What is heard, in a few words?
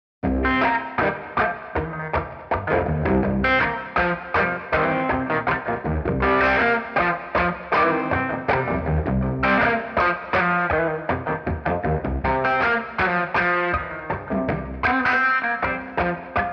Street Guitar Electric Backroad Blues 80